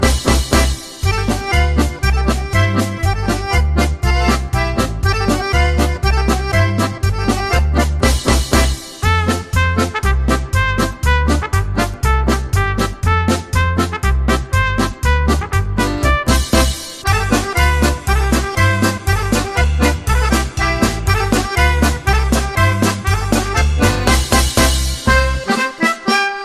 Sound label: Beer and Pretzel Polka